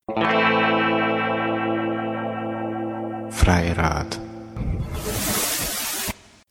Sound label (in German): freirad Jingle kurz